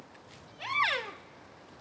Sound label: C elaphus sibiricus